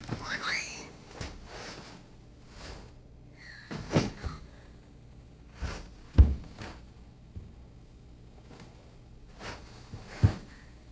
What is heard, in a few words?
shifting weight on a squeaky bed